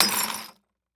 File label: Crash Glass Bottle Throw Bottle Into Bucket Full Of Broken Glass; crashes; AdobeCC